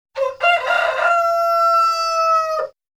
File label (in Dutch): haan